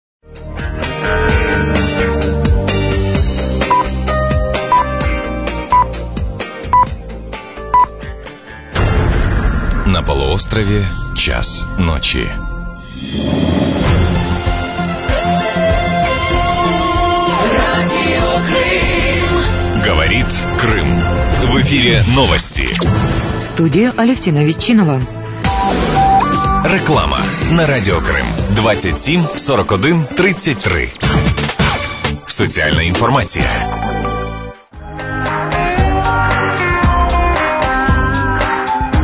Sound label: interval signal; ur crimea